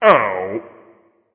ouch, voice; Smite; ymir skin12

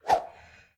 auto fire; smite; xbalanque

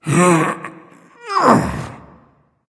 grunt lift; fenrir

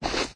footstep soft; guanyu; smite; hades

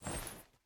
armor short; smite; hera

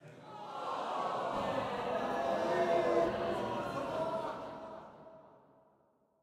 defeat crowd; hades; smite

